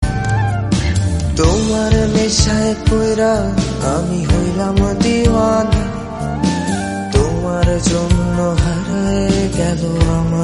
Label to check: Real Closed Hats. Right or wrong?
Wrong